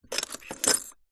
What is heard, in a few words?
household broken ceramic cup pieces movement; zapsplat